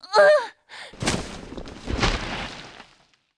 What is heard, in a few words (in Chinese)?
女 死亡 倒地